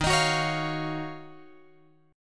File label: D sharp Minor 9th